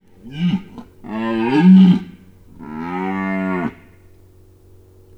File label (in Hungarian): gímszarvas felbőgés repülővel; budakeszi zoo 2010